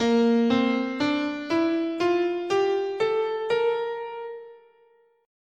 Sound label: Asharp Lydian